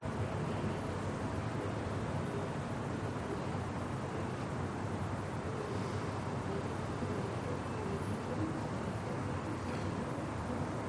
RESIDENTIAL Night Distant Traffic Dogs Barking Car Horns Blinds Garbage truck; gain walkers